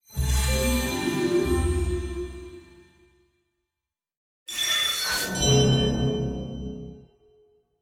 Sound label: Ui Combat; Archeland